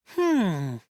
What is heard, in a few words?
hmm